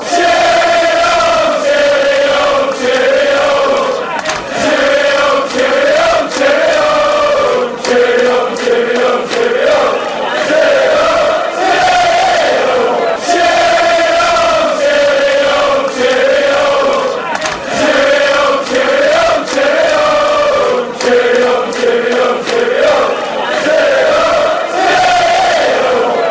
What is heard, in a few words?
cheerio, fanchants